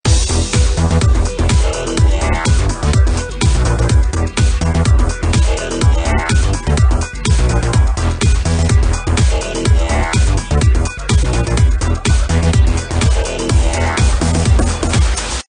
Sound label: game music; Grave Drive